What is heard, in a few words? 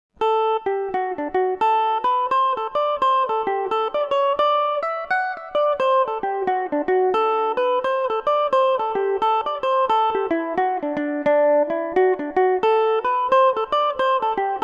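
jig D mixolydian revelation, Irish mandolin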